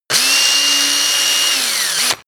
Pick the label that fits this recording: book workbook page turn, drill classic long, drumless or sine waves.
drill classic long